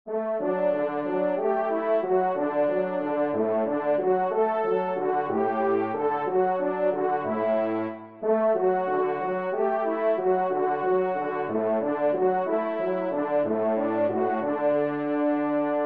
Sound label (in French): trompe